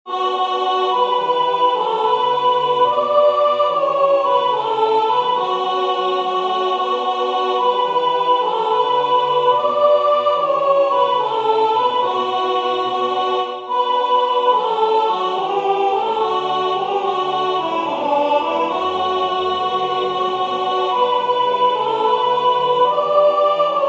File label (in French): Le Christ est né ce soir soprane; Lallement